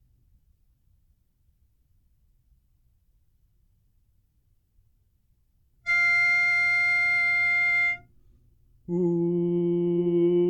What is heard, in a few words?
Angels We Have Heard On High Bass; Christmas